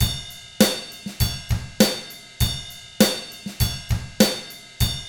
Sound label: ROCK, Drums; ILIO; Double Platinum Drums 1